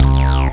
BAS, Eurotech; Sounds Good

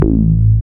TB, Synth Bass, Roland

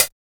HARSH CHH, LOFI HATS

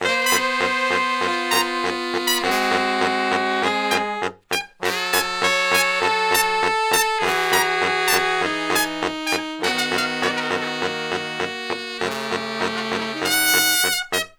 Horn Section Noise; Traditional; Sonic Foundry (Sony Creative Software); Jazz Solos and Sections

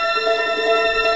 FLOW; M8; CMI Factory

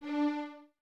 Violins Detache, D 4; 23 Violins; String Ensembles; Miroslav Vitous

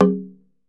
TUNG LO, African Perc

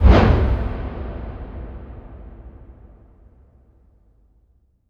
Impact Wipe, Cinematic

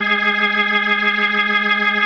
G#3F, B3, Vintage Keyboards

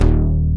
NOIZE BASS; Dance; BASS SOUNDS; Masterbits; Dance Trax

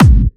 Clubby Kicks, Bassdrums

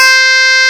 CLAV C5; Big Fish Audio; Synth City

